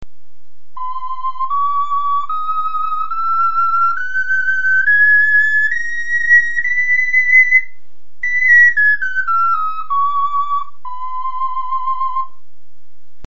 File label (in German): tonfloete klein